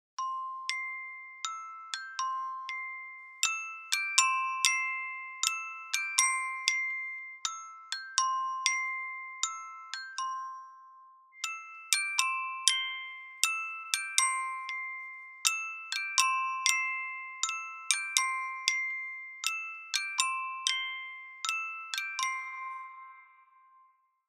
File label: Bells